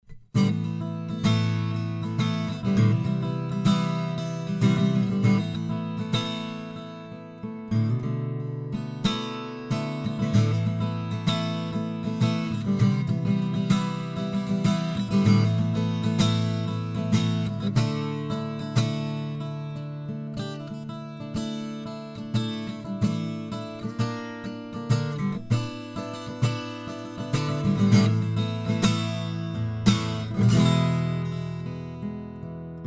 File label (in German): Akustik Gitarre